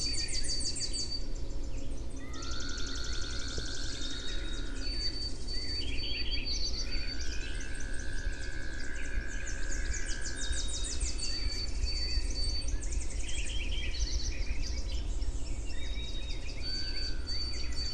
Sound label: wetland sounds; environment